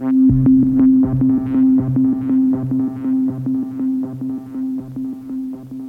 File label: Synths One Shots Tape Synth